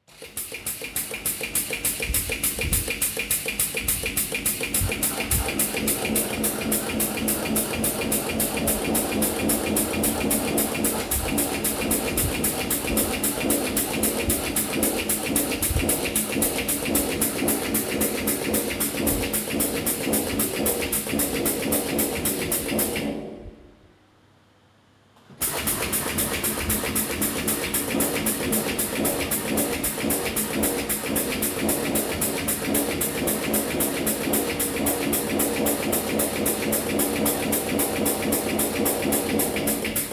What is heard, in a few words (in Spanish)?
Bomba de succion